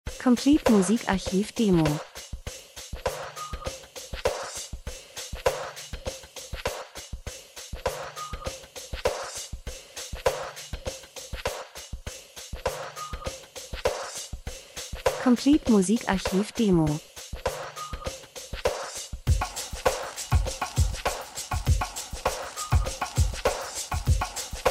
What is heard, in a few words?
drums; Elektro Rhythmus Percussion Fernweh Reisen Afrika Südamerika Berge Land und Leute Reisefilm, Natur Dokumentation